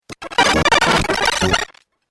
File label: Crazy Glitch 8Bit Style; Video Game Sound Effects